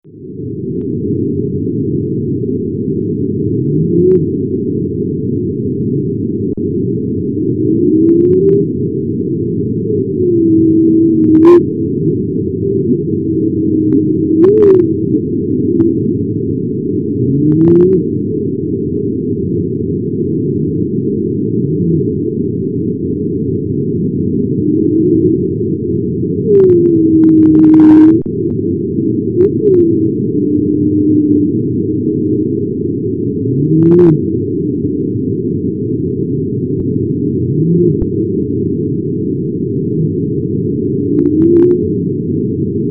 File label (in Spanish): Canto de las Ballenas; amor de ballenas